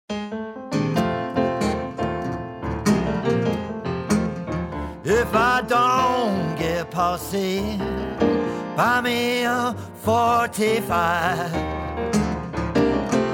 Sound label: policy blues